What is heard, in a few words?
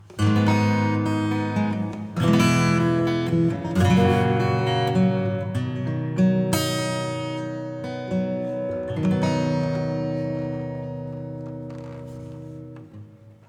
Piano Hall